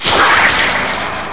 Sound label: WHOOSH